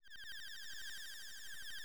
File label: dizzy loop; Airport Runer